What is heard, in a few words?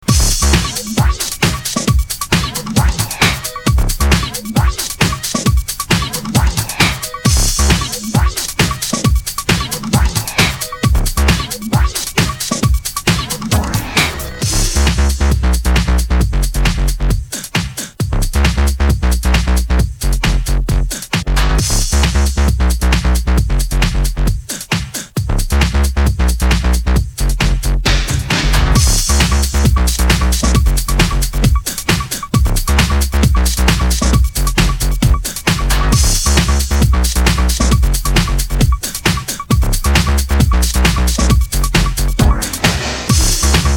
Electric Disco